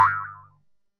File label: cartoon boinging